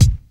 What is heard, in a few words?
bright kick drum a sharp key, Royality free kick drum sound tuned to the A# note.